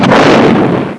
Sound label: Explode; HelloWorld8Bit